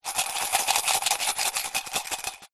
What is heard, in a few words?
foley money coins in metal tin shake; zapsplat